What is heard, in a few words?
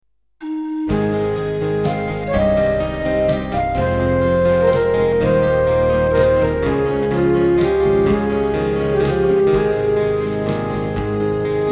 G# Backing